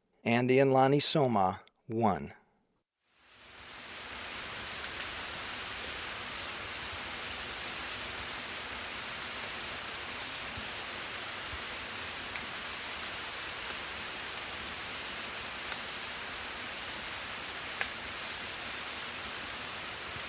Andean Laniisoma (Shrike-like Cotinga), Birds of Ecuador; S. America